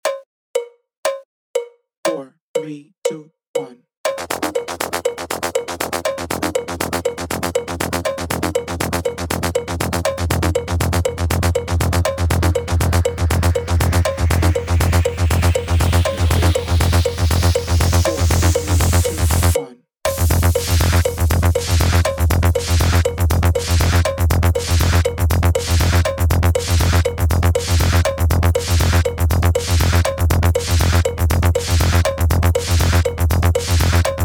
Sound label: Trance Loop 120bpm; Push Agio